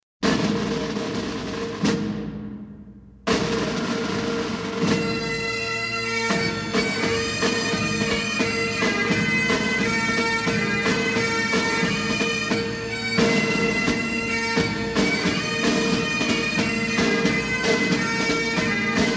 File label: Pipe & Drum; Battle on the Tyne; Music of Scotland